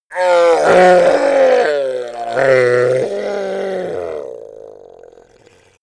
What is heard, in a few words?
goblin groan painful weak; schreie